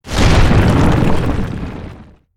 boss skill

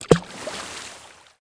potion

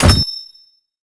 pickaxe

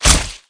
kill

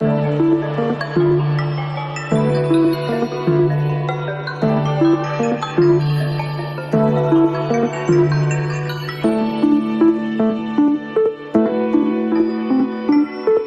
Bgm Fantasy

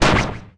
hand

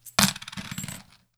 coin

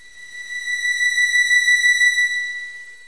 shape shift

